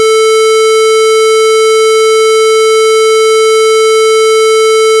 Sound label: square wave